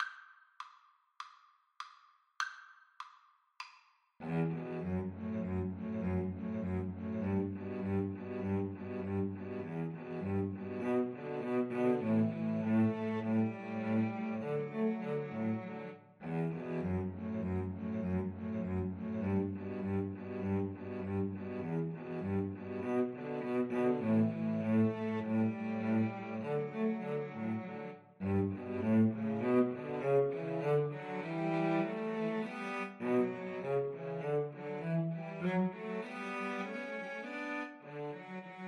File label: Cello trio